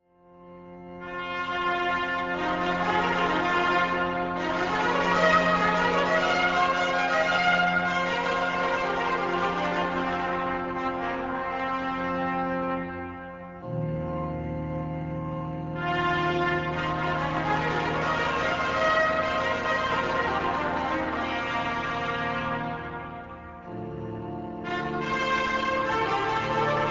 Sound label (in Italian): canzone